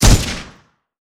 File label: assault rifle fire; gamerip; shattered horizon 2009